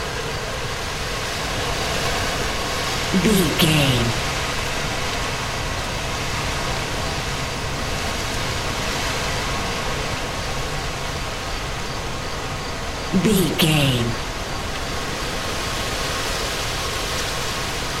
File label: wind night crickets ambience loop